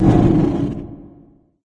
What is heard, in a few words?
lift creak; ambient; source engine; ep2; outland